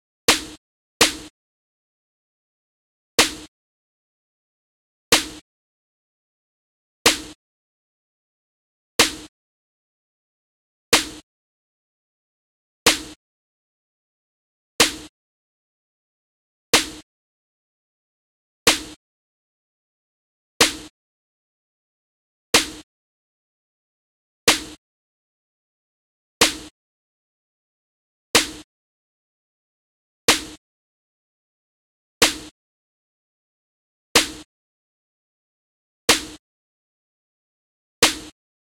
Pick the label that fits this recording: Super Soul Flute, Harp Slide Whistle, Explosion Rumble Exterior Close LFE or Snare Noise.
Snare Noise